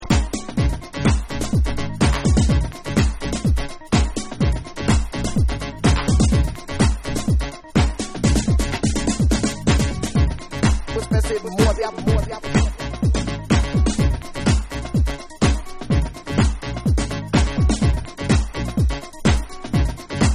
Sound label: Instrumental Mix